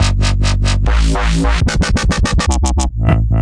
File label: 140 dubstep phase bass, bass loops